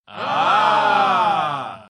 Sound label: Aaaah